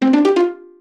pizzicato jingles; kenney musicjingles

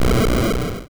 Retro Explosion Short

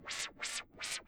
wahwahwah; abstract; Free Sound Assets

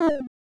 drop, 8 bit

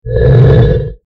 Monster Growl; horror sfx vol 1

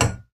metal hit; CC0 breaking falling hit sfx

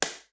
shot

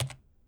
Keyboard - Key

